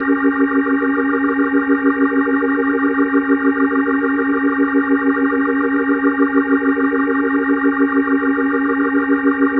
Organ D#7, Hip-Hop, Organ Licks

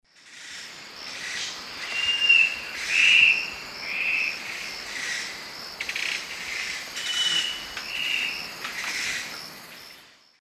Phlegopsis nigromaculata, song; S. America; Eastern Lowlands

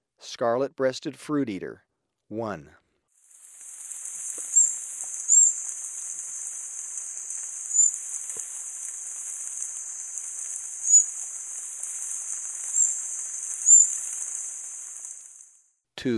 Scarlet-breasted Fruiteater, Ecuador; S. America

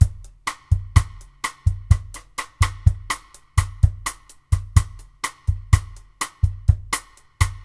Perc, Percussion Latin

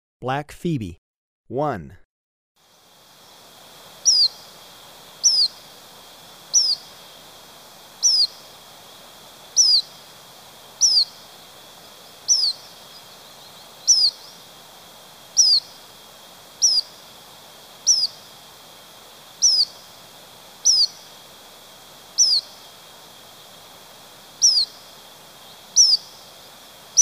Black Phoebe, Birds of Ecuador; S. America

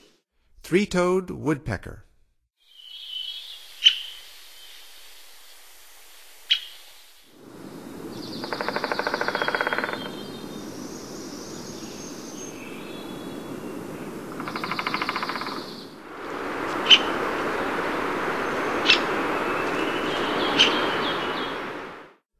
Three-toed Woodpecker; North America; Stokes

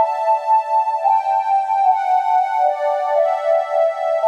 Rez Keys; Rock; Synths & Keyboards

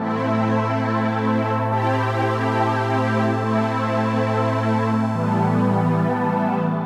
Pad; Dance

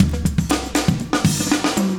120, loops; Sound Effect Library

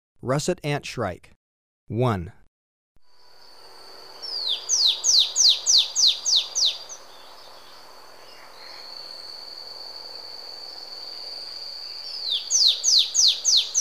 Russet Antshrike, Birds of Ecuador; S. America

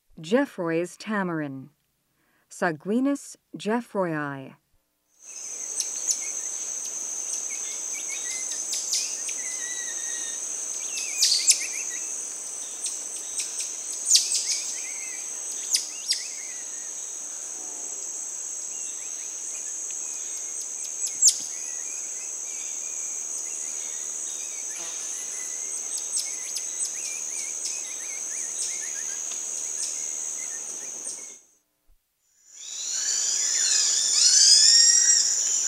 Geoffroy's tamarin; S. America; Rainforest

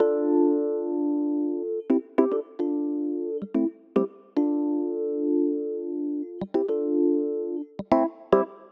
Rhodes; Rock; Keys

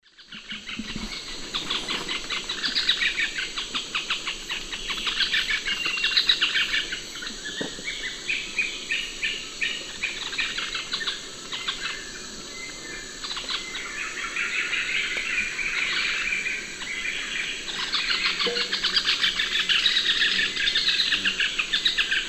Monasa flavirostris, group calls; S. America; Eastern Lowlands

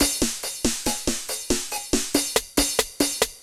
techno 140

